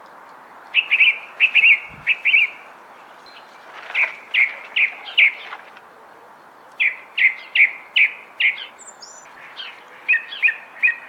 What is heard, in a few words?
thrush